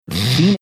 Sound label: snorting